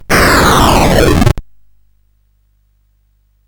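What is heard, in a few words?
Moon Patrol, MOS 8580 SID chip music, games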